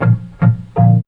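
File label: organ riff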